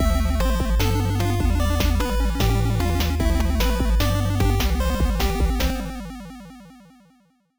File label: gameover; LcdDem SFX